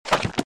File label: close